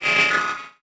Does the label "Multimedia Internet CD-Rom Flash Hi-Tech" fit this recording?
Yes